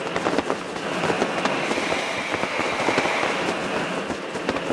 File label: Magician Walking Loop